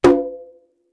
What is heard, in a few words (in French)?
Congas claque resonnant